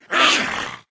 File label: felidae damage, monster